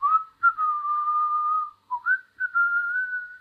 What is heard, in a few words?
whistle, stalker humming